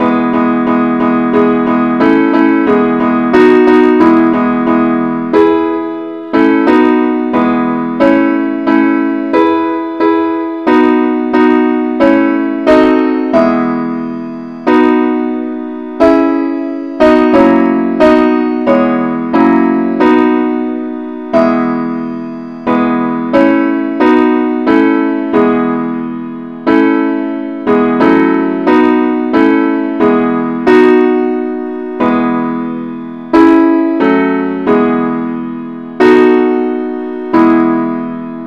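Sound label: simple accompaniment key e; christe Sanctorum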